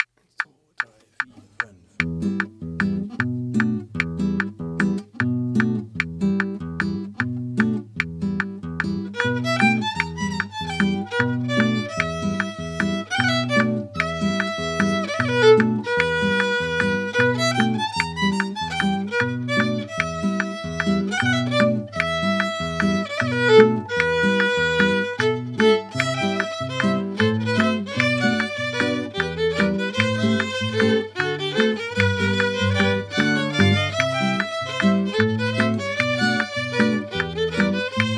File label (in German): Git Vio 150bpm Metronom